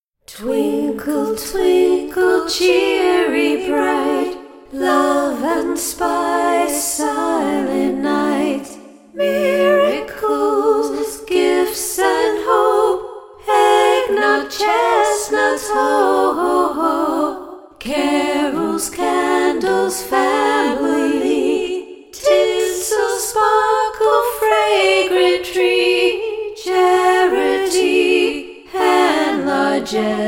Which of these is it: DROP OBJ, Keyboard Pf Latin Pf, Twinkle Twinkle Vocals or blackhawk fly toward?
Twinkle Twinkle Vocals